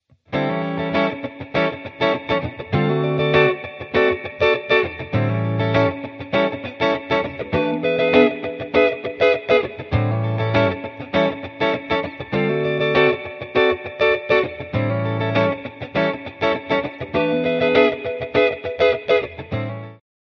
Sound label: distortion dry